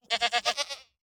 山羊待机声

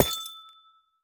紫水晶破坏声

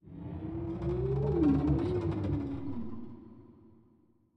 绯红森林菌类声; ambient

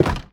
盔甲架破坏声

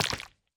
蜂蜜块破坏声

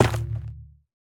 菌光体破坏声

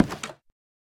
下界木门开关声

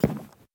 悬挂告示牌踩踏声

